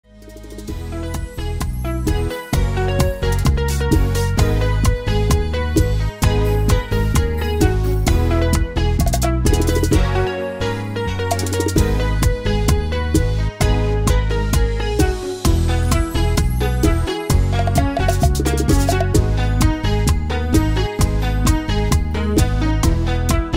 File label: bachata beat